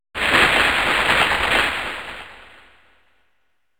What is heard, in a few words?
plant